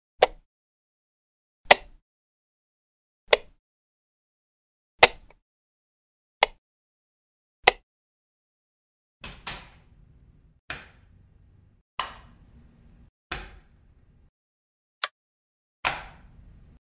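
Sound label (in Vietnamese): Tiếng bật công tắc tắt bóng đèn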